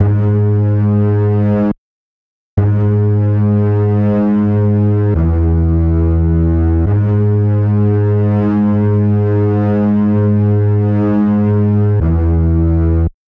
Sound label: bass